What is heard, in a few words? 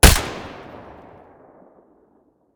ak105 silencer mono, weapons; STALKER; IWP